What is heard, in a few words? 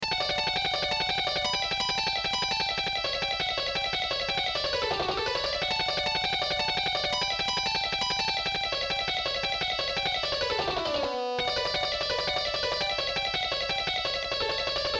solo